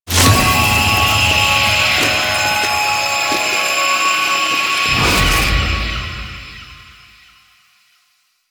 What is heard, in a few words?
Veil Struggle Start; Warframe; The New War; Stolen Plates